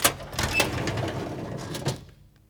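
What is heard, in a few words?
DRAWER OPEN; 1000fx; Doors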